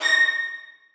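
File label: 1st violins staccato A#6; Sonatina Symphonic Orchestra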